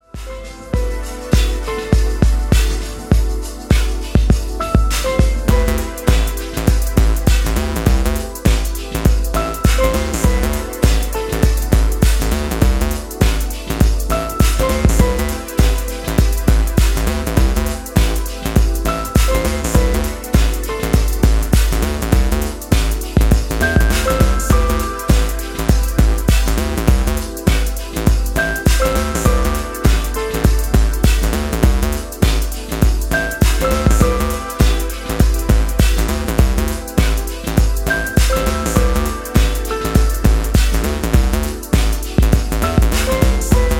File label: photonic piano waves